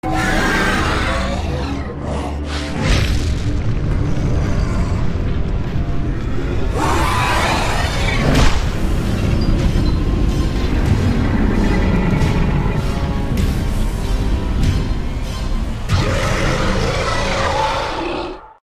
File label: Movie Cinematic